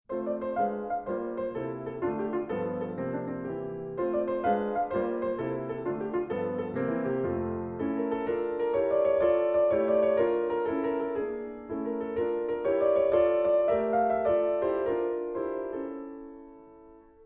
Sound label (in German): Klavierabend